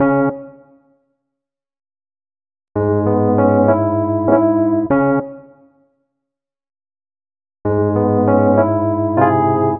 Electric Piano; Club Joints and Street Anthems

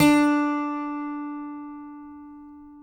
ACGT D4, ACCGTR PICK

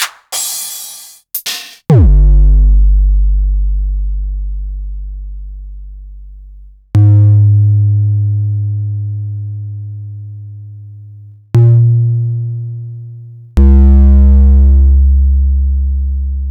Korg Electribe ER-1 Kit

